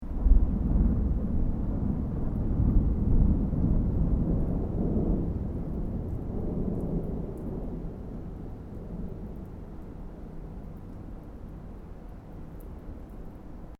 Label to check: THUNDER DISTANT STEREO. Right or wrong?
Right